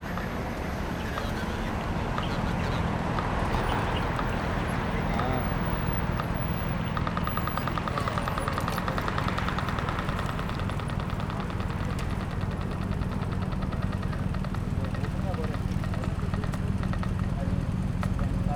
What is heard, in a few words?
Binckhorst Polyrhythm Crossing; Waiting to cross a road